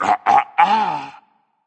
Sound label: disagree berserk; cuchulainn